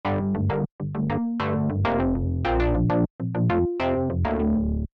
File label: shape soft filtered gain higher, warm distortion